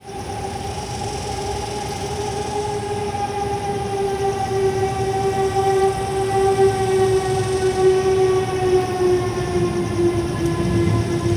Allens Landing Ambient; First Ward; HOUSTON

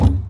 Garbage Can Perc, percussion; Houston; Moody Park

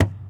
Ticket Counter Perc; Moody Park; Houston; percussion

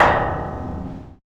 Esplanade Sign Perc; Navigation; Houston

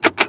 Moody Park Chimes Perc; Houston; percussion

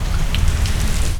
Rain Sweep; Heights; Houston

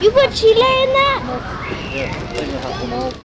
Downtown Houston Discovery Green Chile, ambient

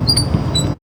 Moody Park Playground Perc; Houston; percussion

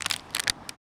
Water Bottle Crumple, percussion; HOUSTON; Fifth Ward

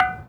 Bust, percussion; Hermann Park; Bust of Bernardo OHiggins; Houston

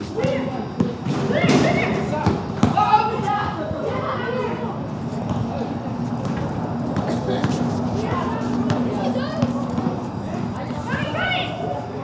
Park Kids Playing Basketball; Moody; Houston

